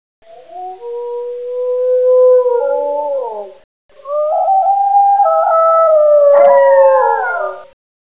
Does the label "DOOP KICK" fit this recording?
No